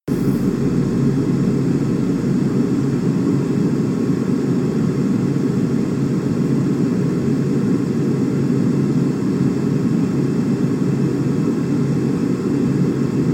airplane ride

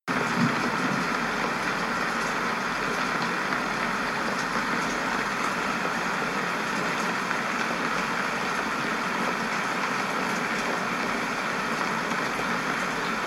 rainfall

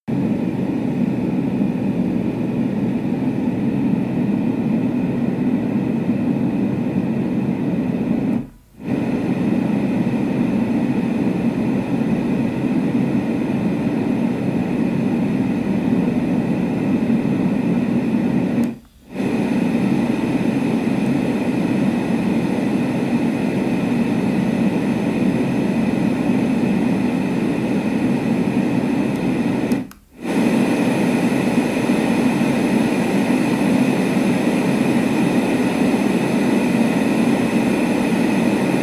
Lectrofan six white noises

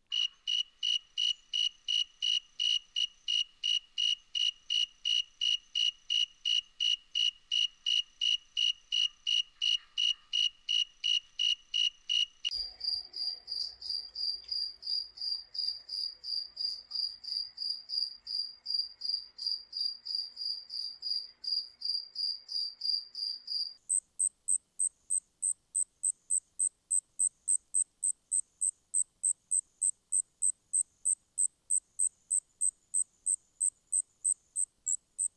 tree field ground cricket noise

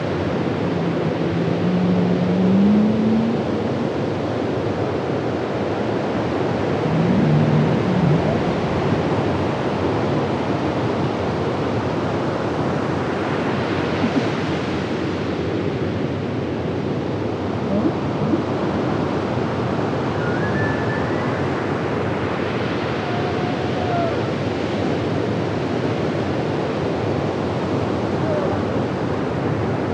whale; SE Whale Party

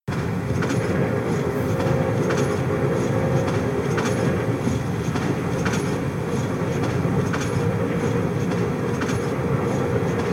train ride

